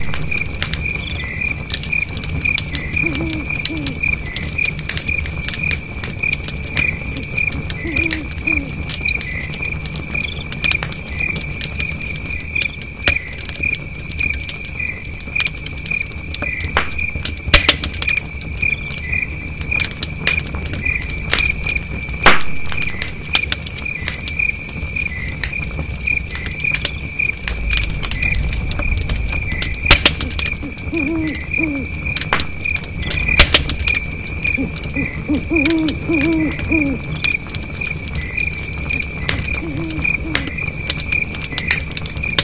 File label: fire owl; quake2